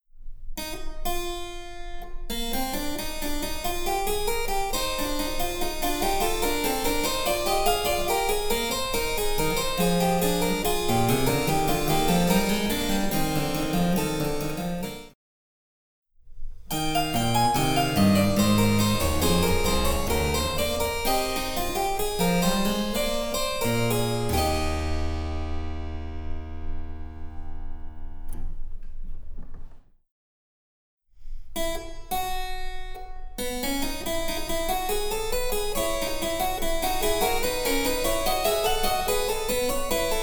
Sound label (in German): Cembalo; Mark Lindley WTC 1